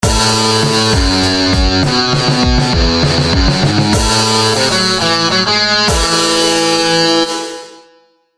Rock

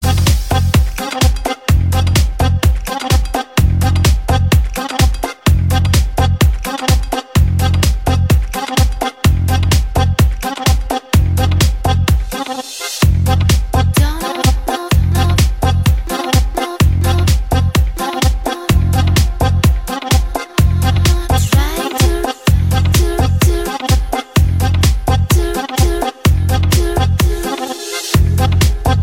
Akordeon Mix

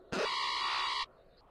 Hull Warning

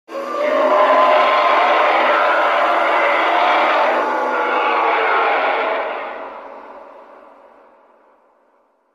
Hollow roar

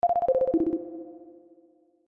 Futuristic Ringtone

